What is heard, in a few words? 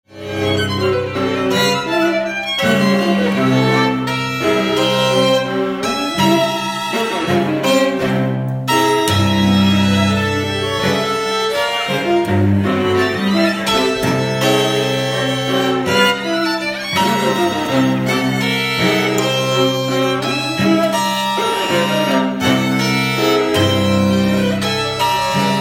string quartet